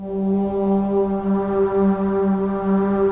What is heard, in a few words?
SYN WHISPRS; Pro-Rec - Synthsonic; Z1 PADS